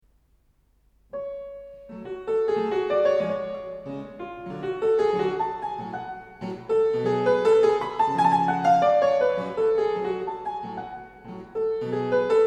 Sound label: Keyboard Sonatas